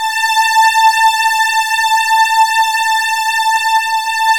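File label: PAD, synth; AMG - Now CD-ROM (Roland); Old Gold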